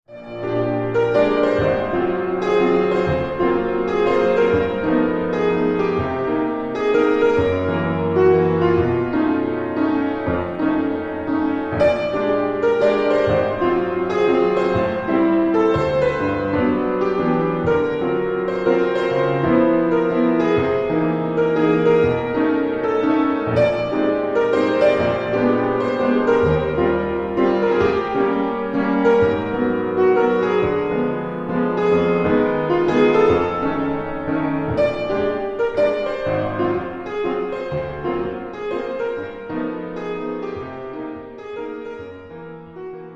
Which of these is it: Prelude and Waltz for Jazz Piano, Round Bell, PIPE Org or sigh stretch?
Prelude and Waltz for Jazz Piano